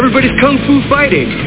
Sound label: kung fu